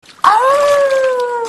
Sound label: awoo; Baekhyun